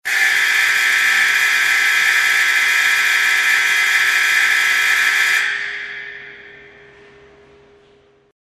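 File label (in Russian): гудок сигнал уведомляющий о счете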